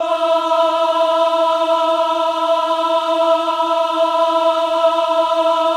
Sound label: VIB E4, VIBRATO, Voices; Spectrasonics